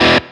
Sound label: E staccato; osu